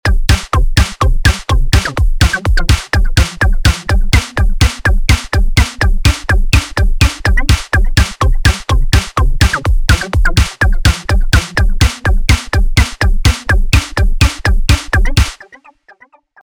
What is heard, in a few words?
Sample Music